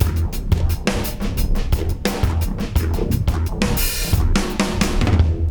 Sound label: fx, Beat Loops, 087; Zero-G; Monster Beats